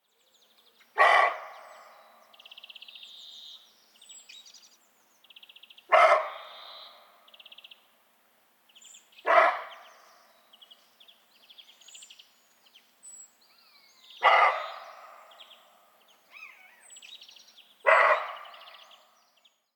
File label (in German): Reh Capreolus capreolus Schrecken